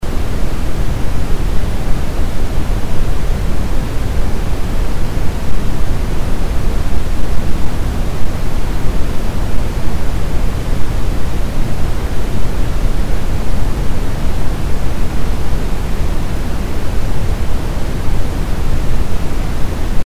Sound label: noise brown